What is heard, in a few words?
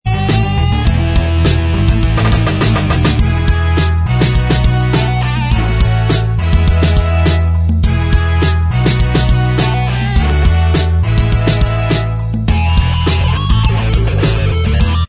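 instrumental track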